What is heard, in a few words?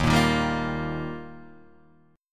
D sharp Major Add 9th, Guitar Open D